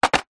household furniture wooden drawer removed small metal jewelry inside set down; zapsplat